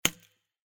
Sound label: button click; lumeno